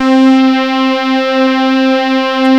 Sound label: Saw Wave; Synth lead; GMTones